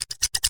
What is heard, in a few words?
scissors